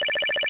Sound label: win Countup; reel rush